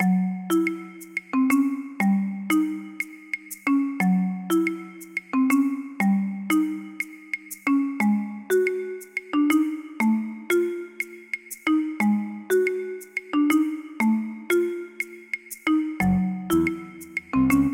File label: Jungle; Fernando and Hugo